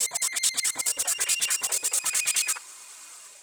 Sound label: anxious 140